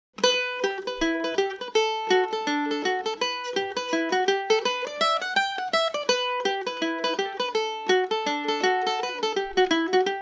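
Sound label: E dorian, irish mandolin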